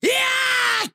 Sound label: Attack